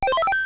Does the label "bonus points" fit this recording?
Yes